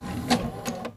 iron attack

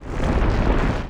golem attack

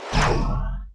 Missile Hit

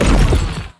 Sudden Ice

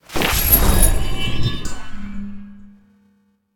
ui quest collect end

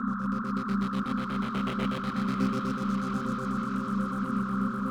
mystical walk

